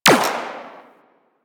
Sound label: Rifle Fire; Warframe; Venus; Venus Flying Nullifier